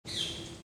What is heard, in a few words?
forest bird; Garry's Mod; tf2